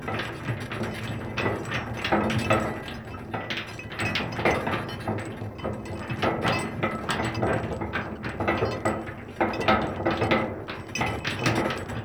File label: Archway Gate Door Loop; UnderCity